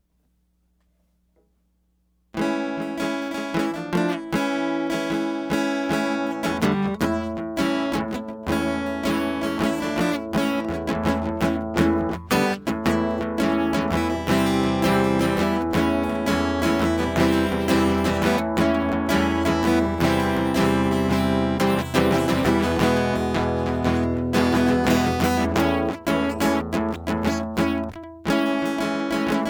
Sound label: Guitar; hyper terrestrial